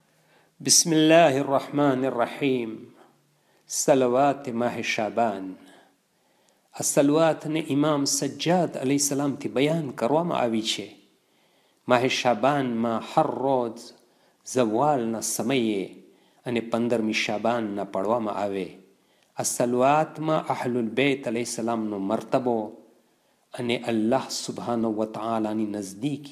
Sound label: Salawate Shabaniya gujrati